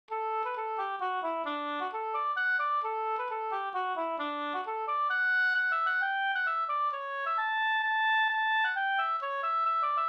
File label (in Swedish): mazurka; Kolshalsen e Konrad Bergkvist Sd